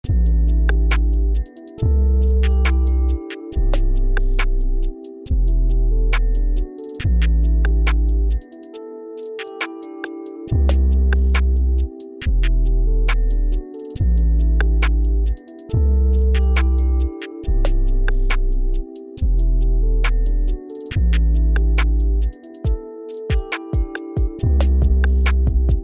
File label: Natures Bassic Melody; smirksmilegrin games